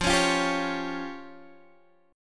F Suspended 2nd Sharp 5th F down Guitar Half Step Down